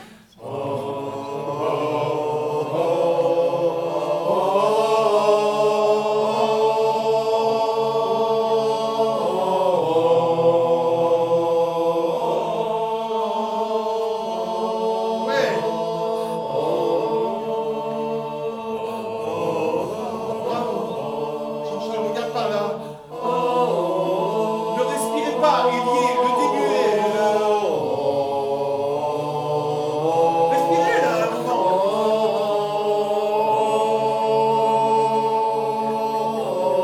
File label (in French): Ténors et Basses, basse appui sur note, ténor rrespirer